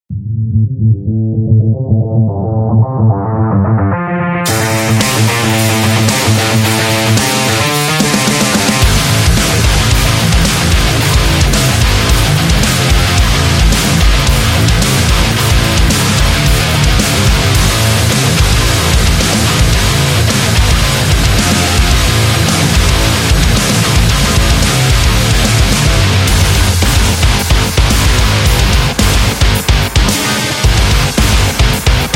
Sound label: Angry